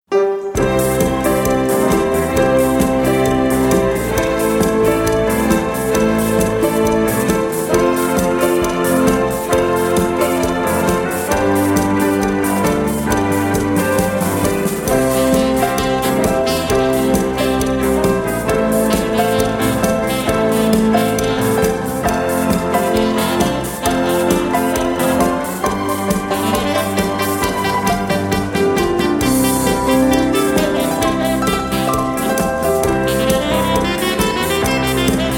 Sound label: TWIST AND SAXOPHONE; SWISS DISCO; symphonic instrumental entertainment- and film music